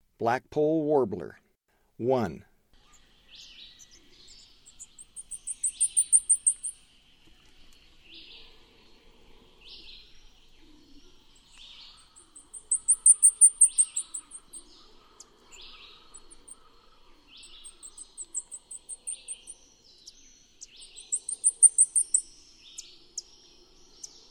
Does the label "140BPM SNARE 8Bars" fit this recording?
No